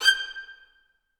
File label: G5, Orchestra; AKAI Advance Orchestra Upgrade 97 Vol.1